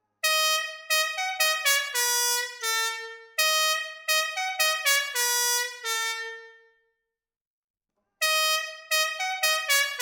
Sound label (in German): stadion hymne